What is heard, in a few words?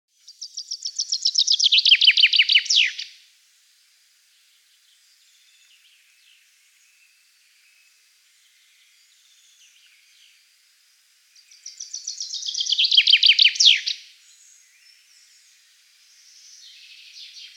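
zyablik voice, chaffinch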